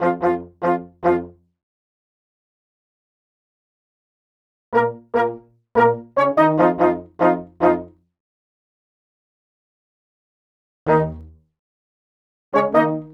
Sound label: horns; Sonic Foundry (Sony Creative Software); Cinematic Funk Urban Tone Poems; Session 05 - The Triad